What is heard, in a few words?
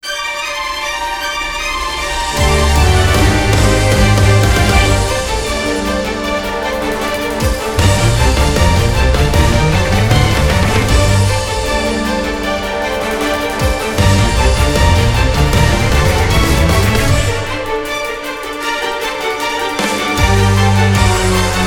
BGM Mission